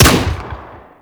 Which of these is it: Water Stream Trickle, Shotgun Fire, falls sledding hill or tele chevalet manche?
Shotgun Fire